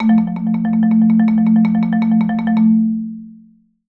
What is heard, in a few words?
MM M3R A2, Marimba; Sampleheads - Dave Samuels Marimba & Vibes